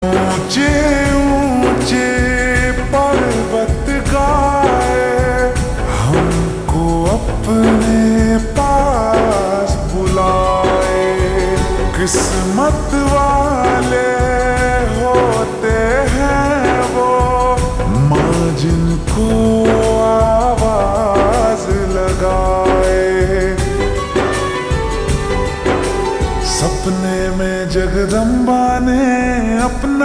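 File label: Ringtone Slowed Reverb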